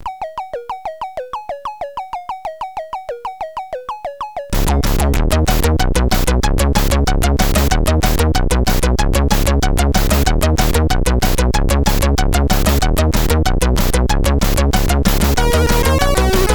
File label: Music fast, CSG8580R5